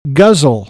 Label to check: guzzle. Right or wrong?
Right